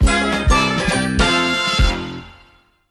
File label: win level